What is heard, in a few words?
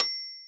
high